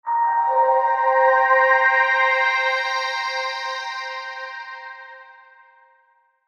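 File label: Musical Electric Keys Rhythm; Musical Electric Piano Sweeper Melody; Keyboards; Blastwave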